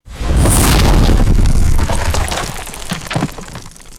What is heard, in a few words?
explosive rock debris impact with whoosh; destruction